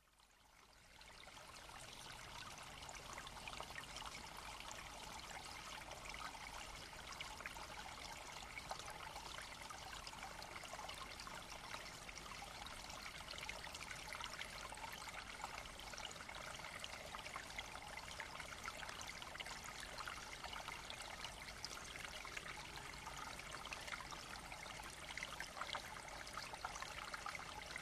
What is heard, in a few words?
brook